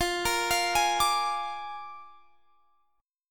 A sharp 7th F, Guitar

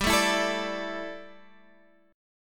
A 7th Suspended 4th G, chord

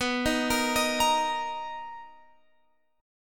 B Major 7th B down Guitar Open F; arpeggiated

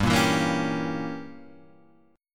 G Diminished 7th G down Guitar Half Step Down

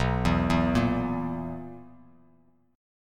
B Major 7th Suspended 4th B down Bass Standard; BM7sus4; arpeggiated

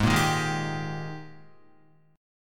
Ab Major 7th Suspended 2nd Ab down Guitar

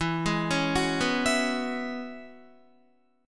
E 6th Add 9th E down Guitar; E6add9 arpeggiated

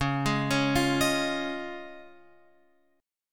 Db Major Add 9th, Guitar, Open F; arpeggiated; JGuitar

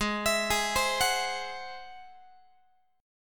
G sharp Augmented 7th G sharp Guitar; G#+7; arpeggiated; x,16,19,18,16,17

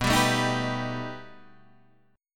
B Augmented 7th B down Guitar Half Step Down

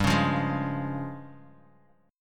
Db Major G; strummed; JGuitar

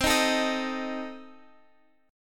C Minor 6th, C, down, Guitar, Drop D

